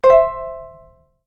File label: default communication notification; ivr